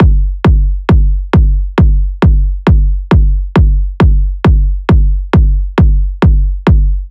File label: Kick Loop 135, Techno Drums